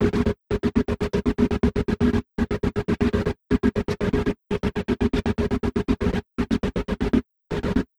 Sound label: Envelope Beat; Drums & Drones